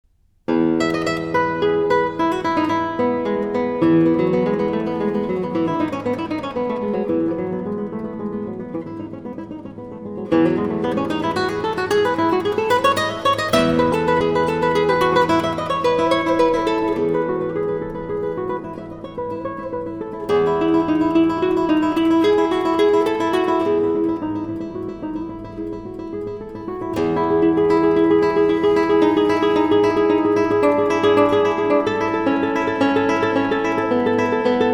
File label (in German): gitarre bach bwv 1006 prelude; jan zacek